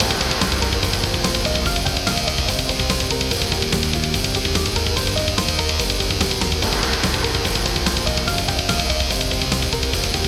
Samurai Music; Super Samurai Rampage android ios mobile windows gamerip 2017; Level 5

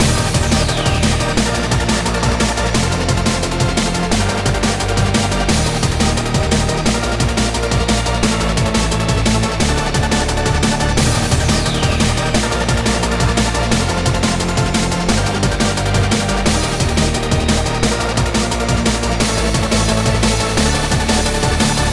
BGM ramen

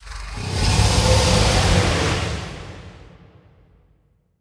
Breath; Barbarians Jokull

